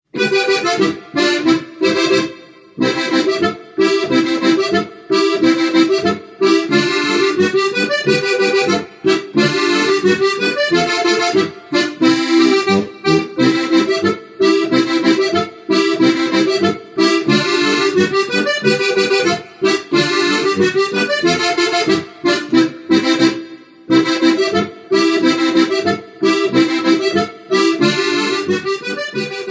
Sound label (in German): Holzfäller Boarisch